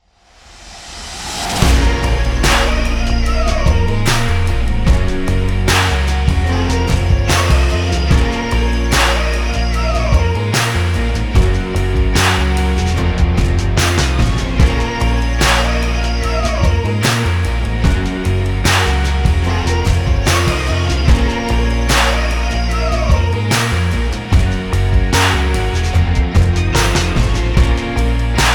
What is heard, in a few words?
Stomp Clap Rock